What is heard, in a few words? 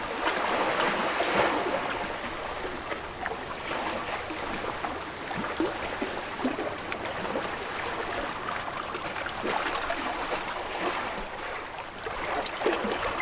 Lap Loop, Seaport; zones